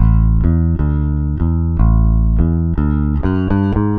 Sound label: SA BS AM, BASS SALSA